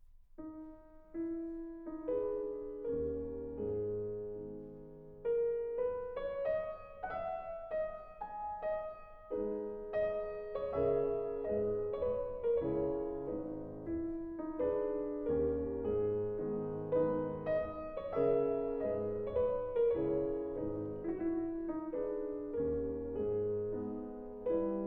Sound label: Mazurkas for piano, G sharp minor, Lento